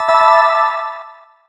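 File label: Effect Heal Dungeon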